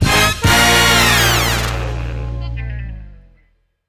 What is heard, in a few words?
Music Effect; Big Funk Band Hits.